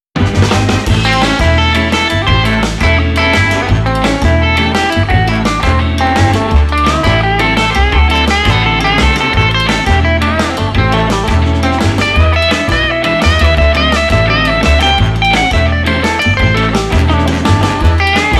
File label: cheerful entertainment dual; by burak sound bank; cheerful vol 8